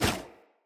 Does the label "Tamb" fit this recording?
No